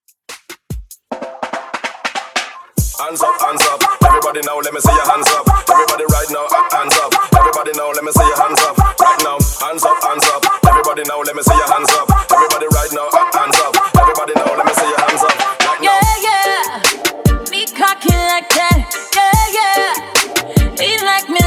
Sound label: Top40 Hype ReDrum Uptempo 145 BPM